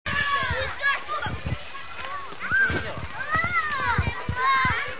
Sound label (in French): ambiance jeux enfants